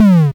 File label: synth no, machines